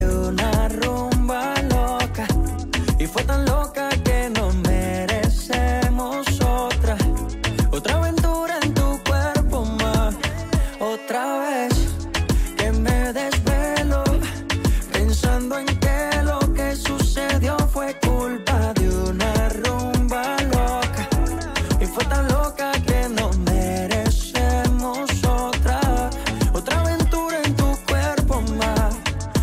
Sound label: Rumba